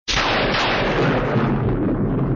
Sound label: Thunder Sms Tone